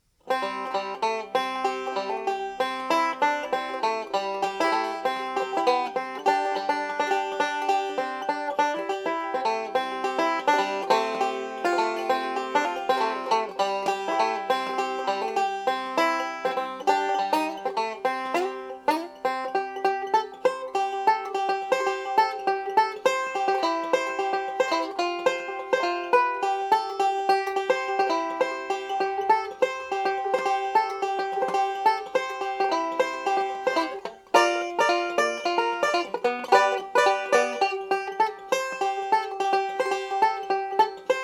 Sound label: resonator